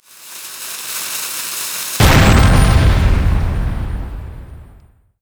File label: item crate smash mid